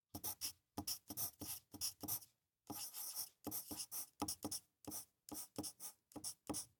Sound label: Write; LOOPERS